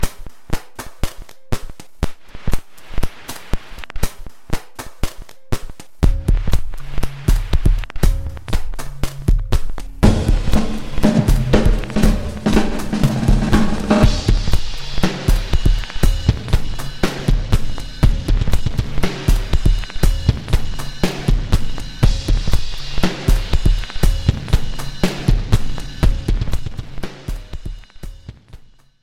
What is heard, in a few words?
Glitch Ringtone